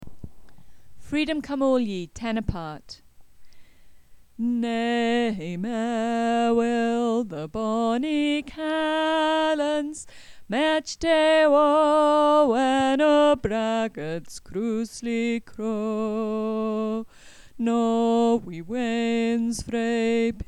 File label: tenor